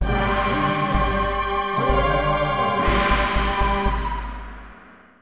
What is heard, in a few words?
Prehistoric Realm Fanfare; Glover; Nintendo 64